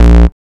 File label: Bass B, Offbeat Bass; VEC1; Club